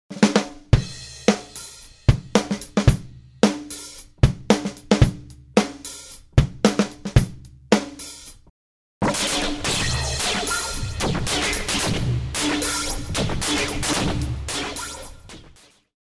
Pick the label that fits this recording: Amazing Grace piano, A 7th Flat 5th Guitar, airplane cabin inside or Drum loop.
Drum loop